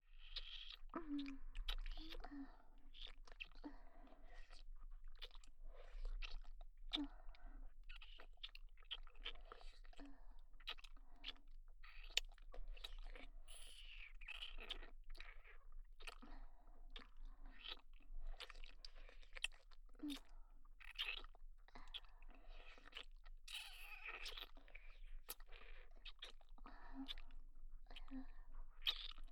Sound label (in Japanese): 両乳首舐め ループ, ASMR